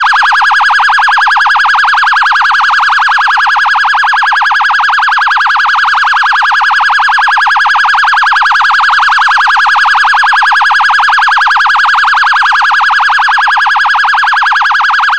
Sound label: police phaser siren